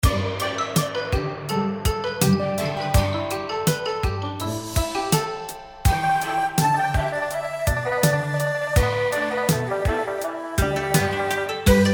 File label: prize pick music; Chinese; Pig's Feast